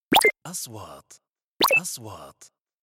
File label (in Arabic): نغمة نقره الكترونية, transitions